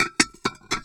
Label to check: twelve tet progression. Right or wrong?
Wrong